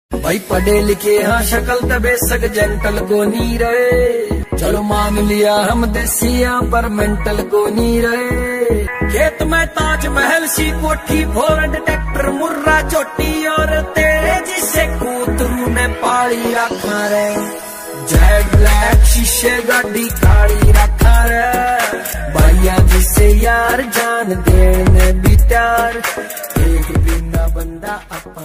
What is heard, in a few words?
haryanvi ringtone